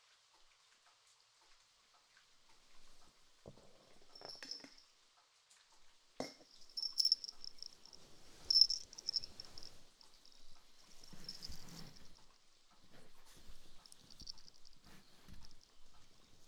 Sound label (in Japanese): 耳かき(右); 昼